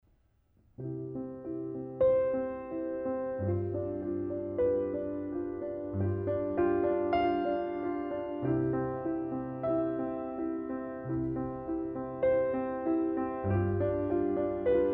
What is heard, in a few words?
Distant Bells